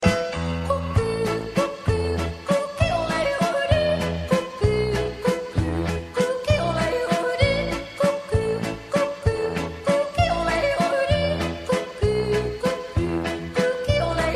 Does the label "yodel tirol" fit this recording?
Yes